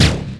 Pulse

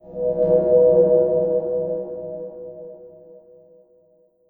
D R O P S A M B

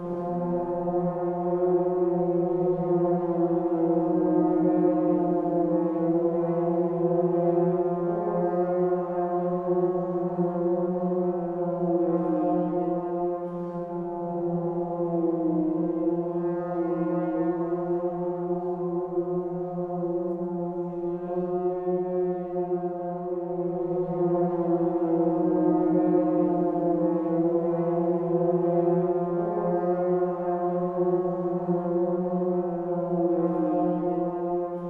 Endboss Brass; Future Unfolding

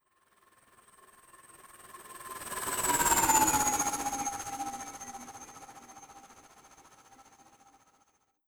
Engineer Spell Drone Slow; Legend of Keepers

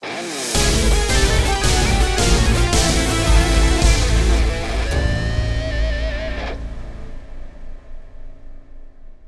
Stingers failure

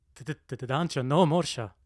Scared

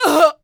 Enemy Generic Human Death Crushed

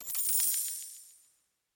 Item Machine Buy; Santuario

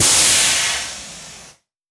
Arm Steam; Legend of Keepers

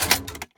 Wall trap prepare

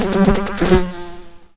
Bee Attack; Knight Online

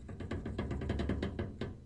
Gear

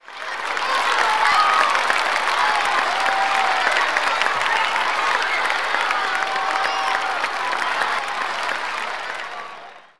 Scenario Complete

